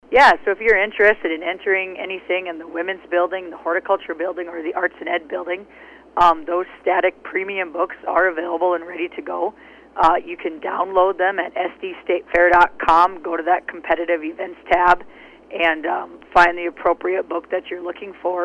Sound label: Static